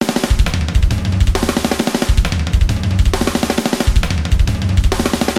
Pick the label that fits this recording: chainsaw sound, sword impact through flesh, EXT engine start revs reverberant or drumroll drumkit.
drumroll drumkit